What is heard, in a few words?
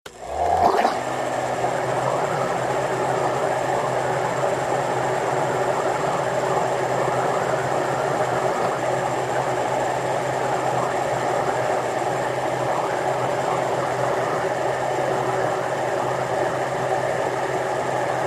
Blender Slw On Slurp; appliances